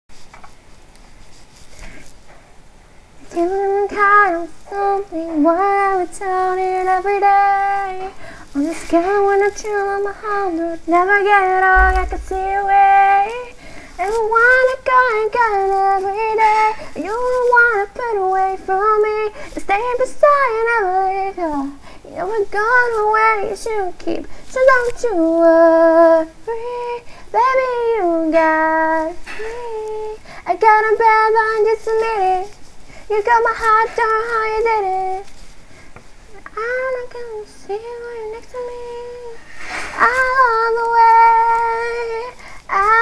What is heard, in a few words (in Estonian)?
Valss